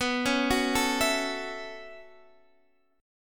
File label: B 7th Suspended 2nd Suspended 4th down Guitar Open D, chord